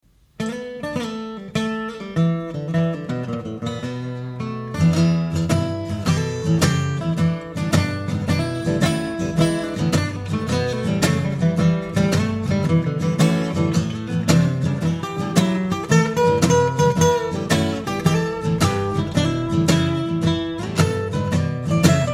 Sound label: blues